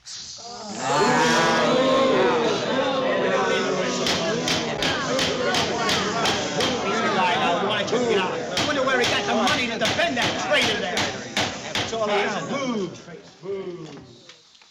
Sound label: crowd in courtroom booing with gavel hits some call out; Ambience; 35mm Mag AMBIENCE; S12-11 Crowd in courtroom booing with gavel hits